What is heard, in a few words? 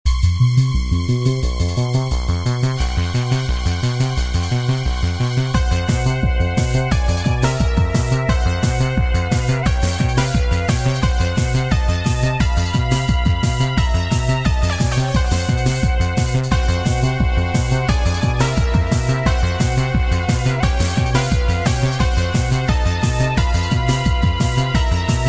Battle